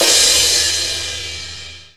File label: crash; realdrum